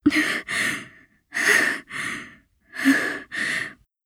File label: pained breath; moeka